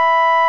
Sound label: FARF COMBO, Electric Organs; E-MU Formula 4000 Series Vol. 3 – Analog Odyssey